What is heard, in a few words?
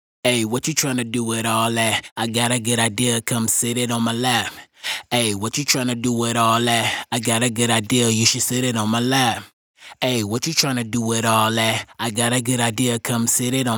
hook 98, Dry Vocals; Raw Vocals; Slate Digital Omnivox Vocal Sample Pack